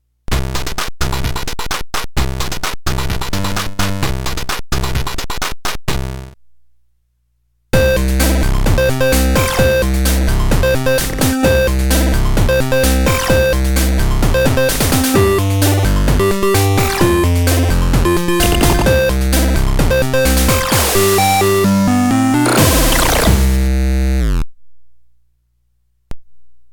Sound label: ds lite min light